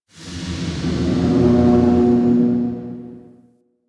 Distant Pipe Burst; Ambience; Warframe; Corpus Railjack

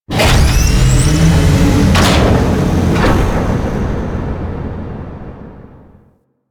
Computer Activate; Objects

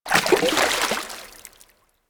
Fish Jump Splash B; Eidolon; Warframe; Ambience; Eidolon Plains

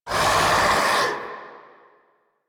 MITW Triangle Pain A; Warframe

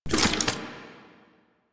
Turret Tether Close; Warframe; Zariman

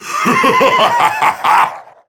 Death Laugh; Warframe; Galleon Of Ghouls; Vay Hek

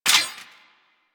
Sniper Mech; Sentient; Crp Sent Amlg Sniper

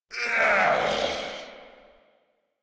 Death, Grineer Male

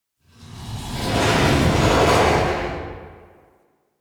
Town Large Moving Object, Ambience; Warframe; Duviri

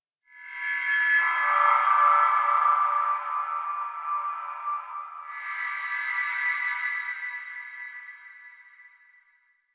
Texture One C, Ambience; Warframe; Rail Jack

